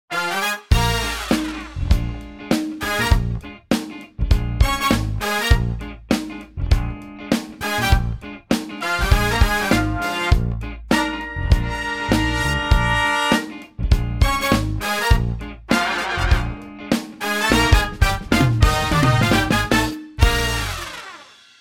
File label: Slow CHH; MOJO